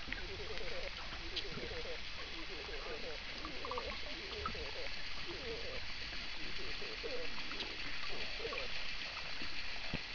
pigeon like sounds, soniferous river project sounds